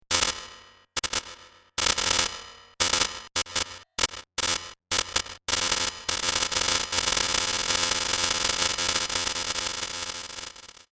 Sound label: electrical sound